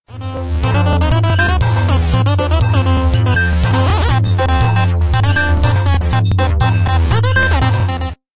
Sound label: floush